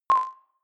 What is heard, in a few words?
click code room high, signale